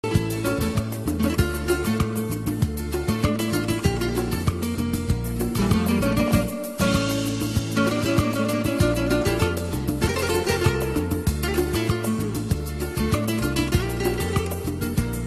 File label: amazing classical guitar